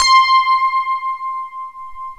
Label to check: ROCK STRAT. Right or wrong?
Right